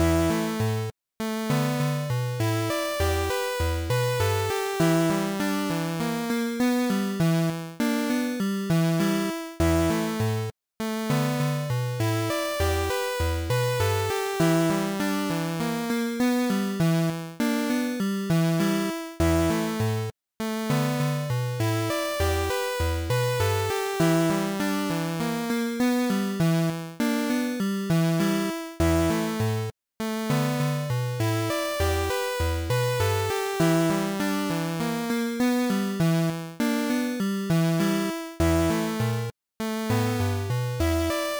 quest of magic cowboy dude chiptune; Fakebit / Chiptune Music Pack

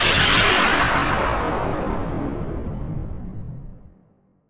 super Laser

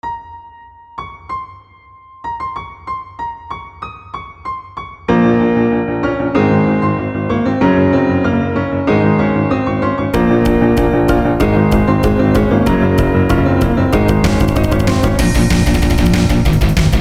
boss fight; longer boss fight style music; Orchestral, rock, and chiptune

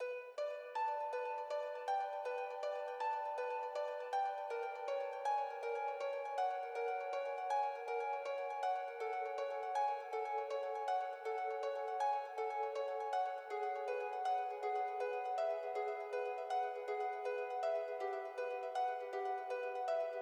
Out in Space Menu

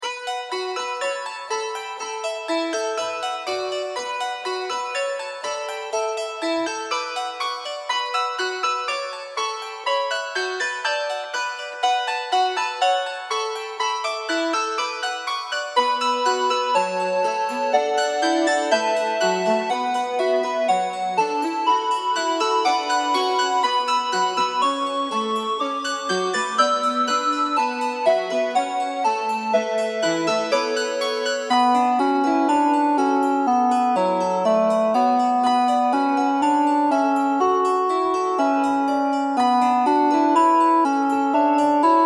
hymn 16-bit

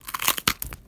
crunch

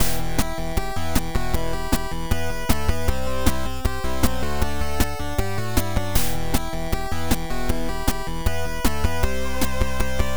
Final Boss Battle

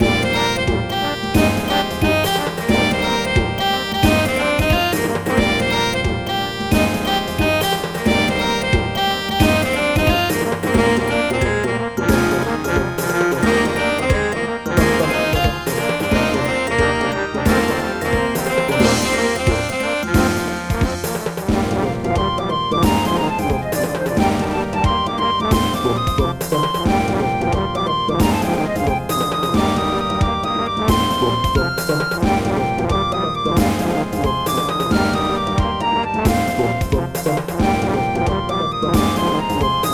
victory

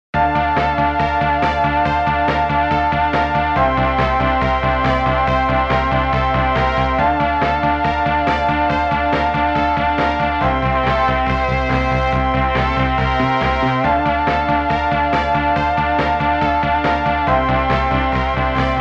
Neon Action Vaporwave End

